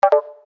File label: Chime Percussion; Multimedia